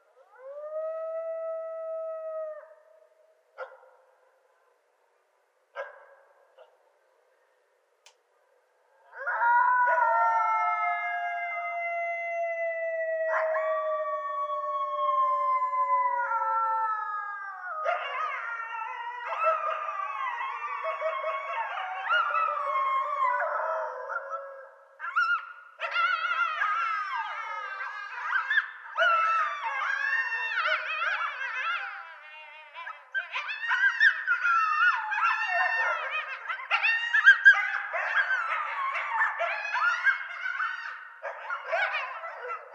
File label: Coyotes; Coyotes (Canis latrans); night sounds